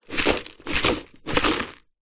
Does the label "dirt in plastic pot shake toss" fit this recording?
Yes